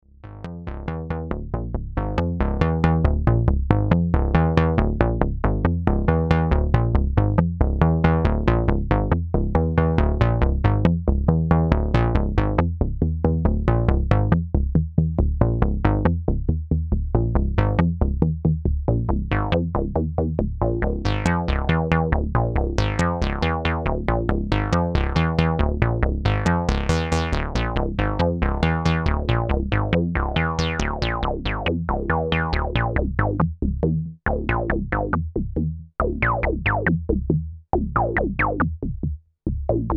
Equalized M15 Sequenz

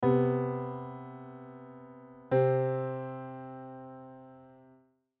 Septime

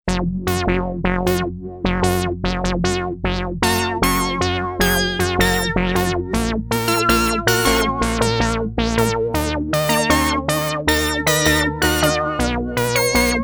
P600 Filter LFO und Vibrato unabhaengig; gligli

